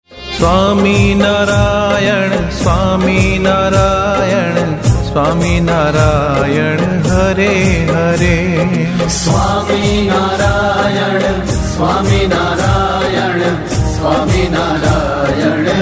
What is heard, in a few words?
swaminarayan dhun without music